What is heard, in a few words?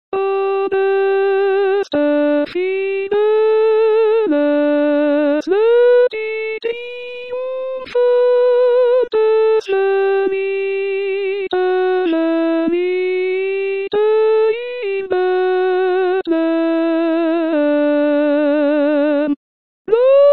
Soprano; adeste fideles